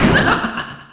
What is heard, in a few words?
damage; jevil